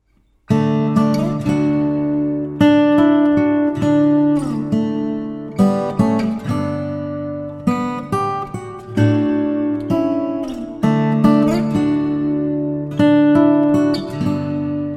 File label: guitarist, Instrumental Wedding Guitarist